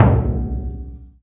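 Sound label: loud drum, Burundi; Heart of Africa; Spectrasonics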